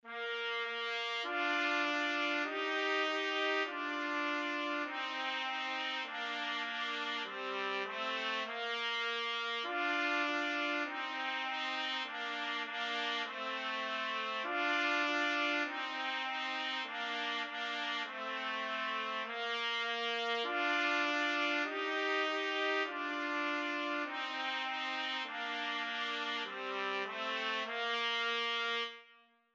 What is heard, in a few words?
twinkle twinkle 2 trumpet